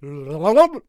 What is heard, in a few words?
Bard Attack Vocals